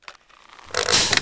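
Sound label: crossbow reload string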